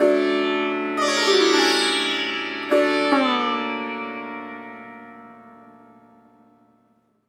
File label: d minor sitar chord